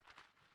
hoe, farming

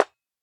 shield wood, attack impact

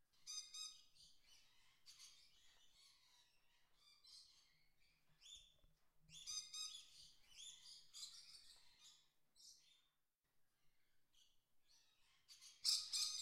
day tropical, ambient

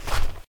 fs gravel, singlesteps

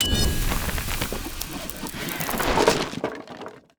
Mill, Complete; 0AD

